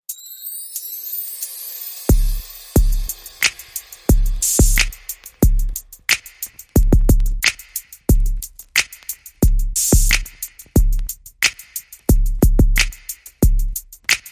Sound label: Coach Beat